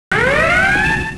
red alert

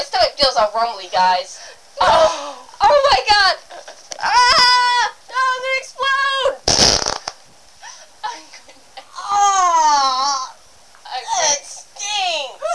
fart

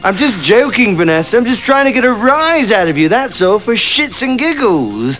giggles; shagadelic

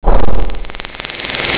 perc

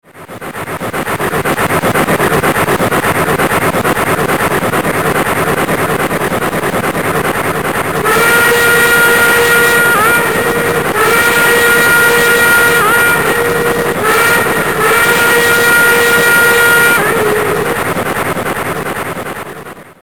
full steam

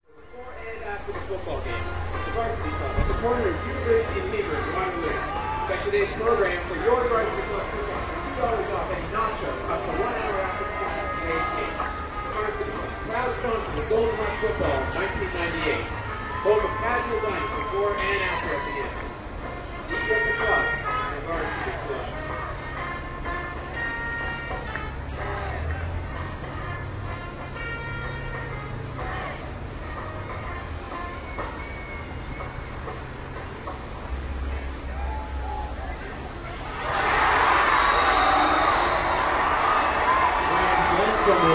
noise

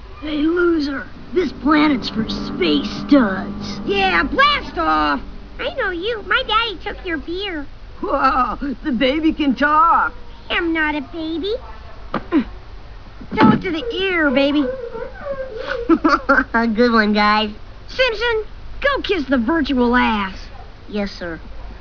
babytalk

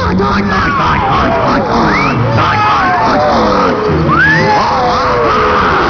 haunter

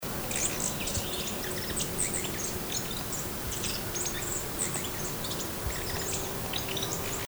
Mystery Bird, birdcall; Bird Call Recordings

